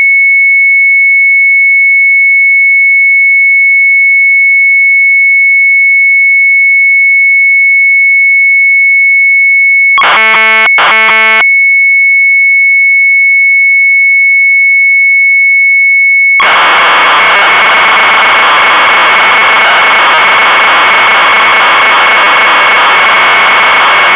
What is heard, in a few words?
MZ800 Tape; ENGELSE WOORDEN; retromelsarchive; sharp mz800